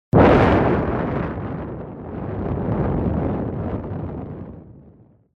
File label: Loud crash - Produced by harshly blowing into iphone mic; sound production